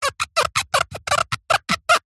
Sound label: food pear rub squeak